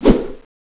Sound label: katana swish